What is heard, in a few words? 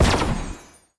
laser